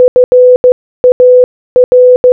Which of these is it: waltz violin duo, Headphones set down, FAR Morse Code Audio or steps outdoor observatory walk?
FAR Morse Code Audio